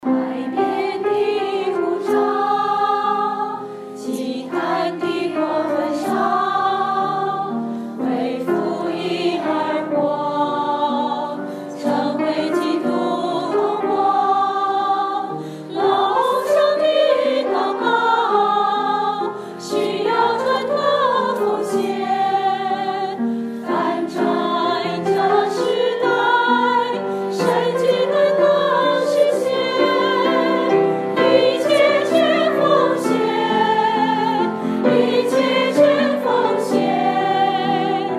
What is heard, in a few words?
Chinese Training Banner Song